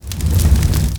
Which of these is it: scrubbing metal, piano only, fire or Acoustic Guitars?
fire